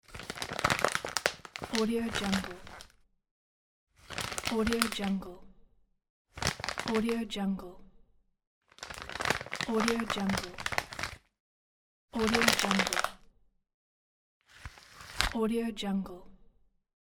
packaging

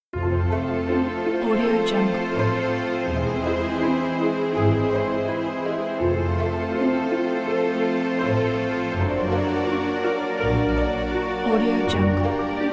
opening cinematic; ed-musicproductions

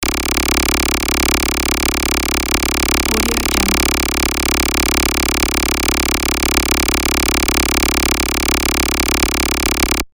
user interface type fast

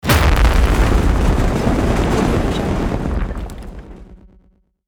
explosion

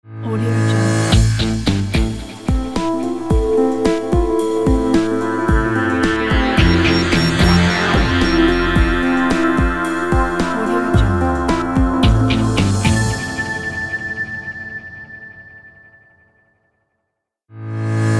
crime scene logo

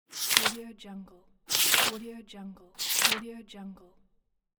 paper slide; welltone

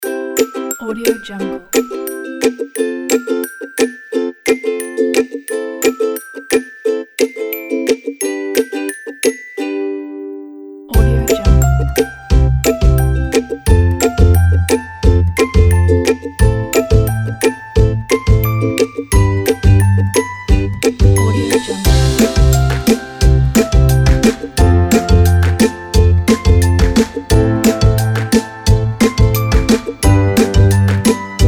melody of joy

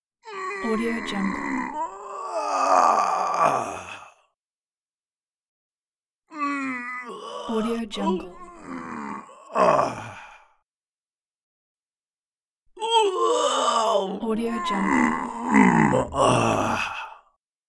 man straining

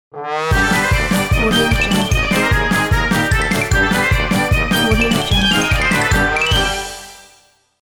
cheerful circus logo

